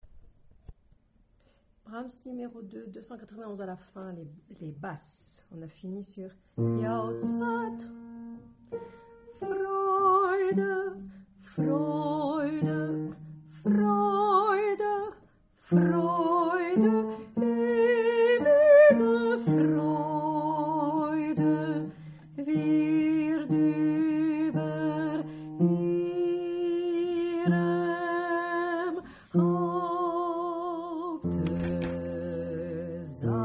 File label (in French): fin Basse